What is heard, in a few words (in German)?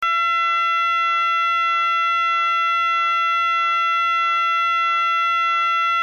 ton, Signalgeraete